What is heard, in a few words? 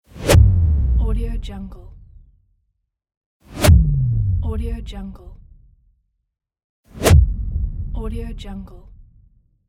swish boom